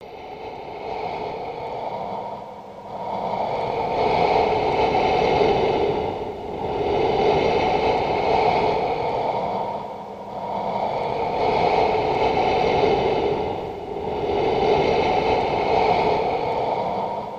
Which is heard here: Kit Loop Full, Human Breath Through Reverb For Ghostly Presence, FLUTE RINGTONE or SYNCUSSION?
Human Breath Through Reverb For Ghostly Presence